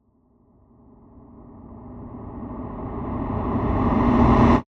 Crescendo Airy, Production, Element, Crescendo, Airy, Suspense; Crescendos